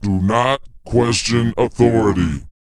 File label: PITCH LOW, Vocal, SCI-FI; 5 SCI-FI CHZ